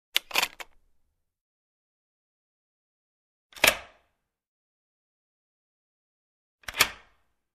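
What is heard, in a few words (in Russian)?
различные звуки дверных замков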